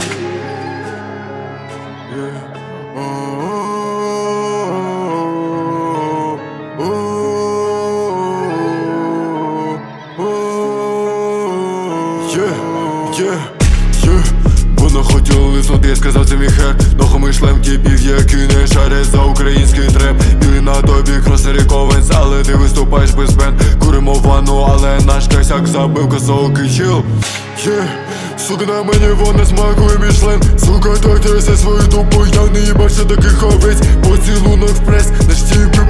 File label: ukrainian rap